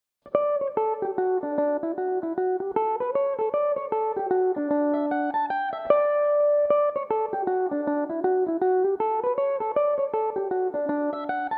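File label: D major, Irish mandolin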